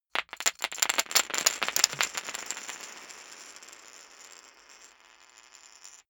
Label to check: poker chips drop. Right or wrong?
Right